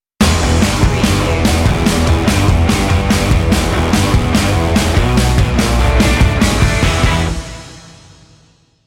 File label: rock commercial stinger